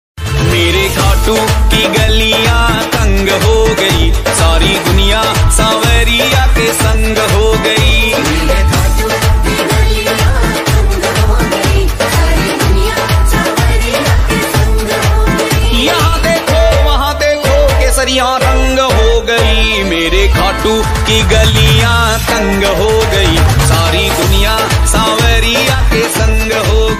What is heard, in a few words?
flute ringtone, bhakti ringtone